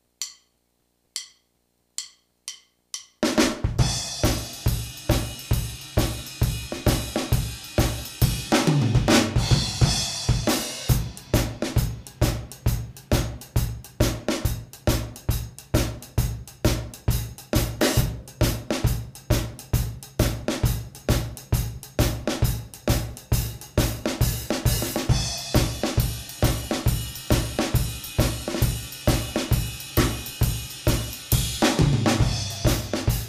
Blues Shuffle, 135 BPM, A 12/8 blues-style shuffle groove.; drum